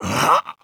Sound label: vce pull